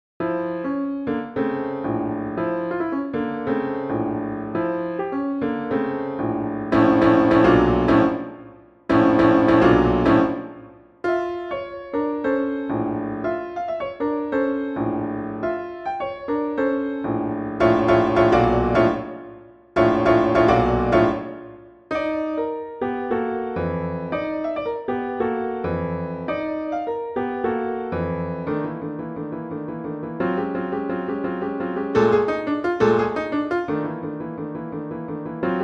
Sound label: miniature in jazz, piano; pieces pour piano